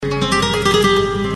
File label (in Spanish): guitar